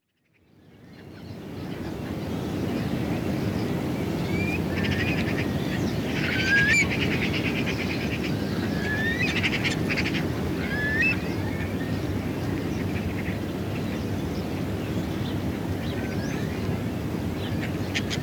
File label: Low Lying Marshy Pasture Winter; Rural Soundscapes; BBC Sound Effects Library